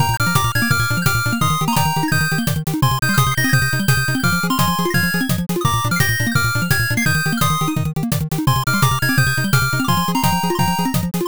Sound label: bgm drive